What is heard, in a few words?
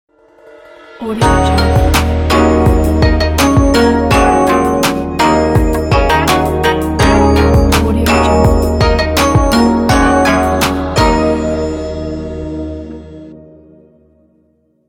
chinese walk logo